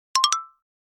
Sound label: new message chime